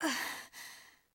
Breath; Lewd SFX

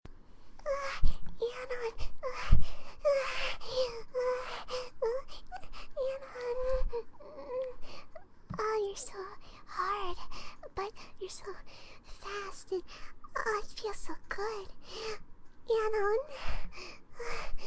moaning; Fluttershy

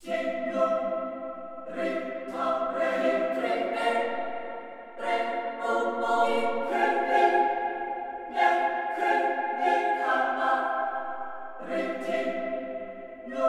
choir all, 145BPM

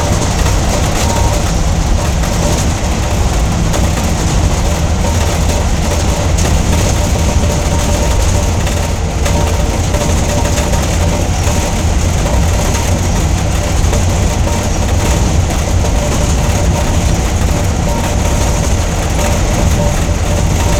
Large Generator; Generators

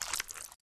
slosh; Lewd SFX

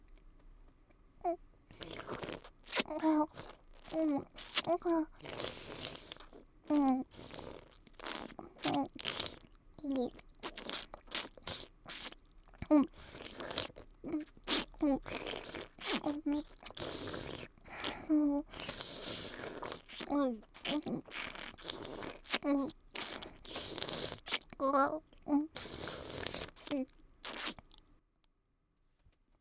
slurps

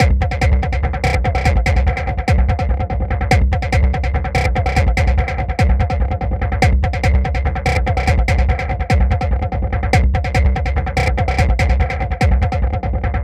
industrial beat, 145BPM

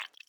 slosh; Lewd SFX

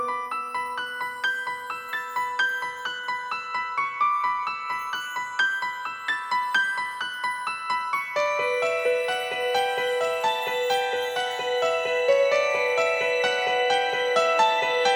instrumental+choir

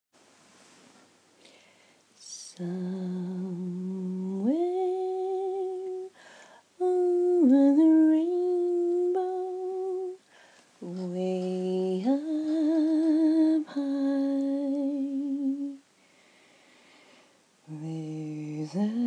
[F][singing][lullaby]; positivity

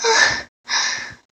Moan

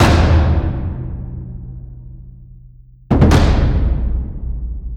taiko drums expression, 145BPM

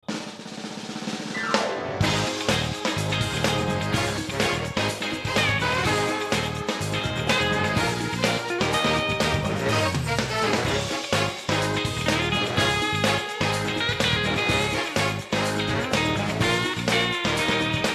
Instrumental, No Chorus; Season 1

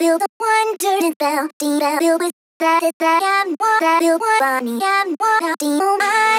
Glitch Vox, 140

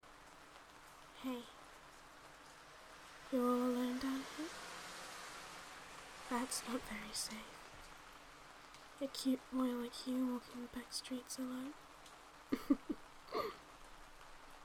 rain

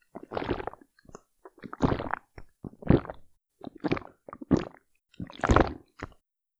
pump; Lewd SFX

